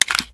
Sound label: pi mike reload xmag magout; gmod